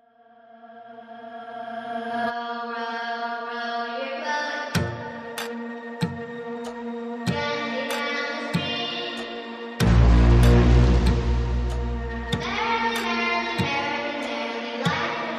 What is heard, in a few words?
Row Your Boat Horror